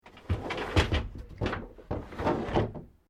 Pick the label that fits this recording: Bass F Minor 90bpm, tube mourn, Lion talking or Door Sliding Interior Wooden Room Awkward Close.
Door Sliding Interior Wooden Room Awkward Close